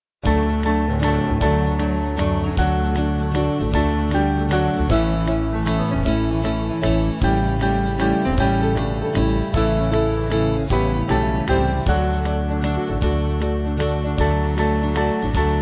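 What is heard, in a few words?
Band, Music Backing Files for Churches; Up Calvarys Mountain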